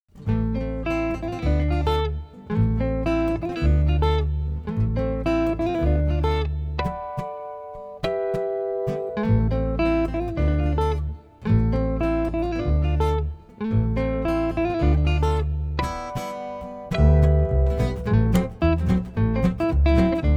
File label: trio jazz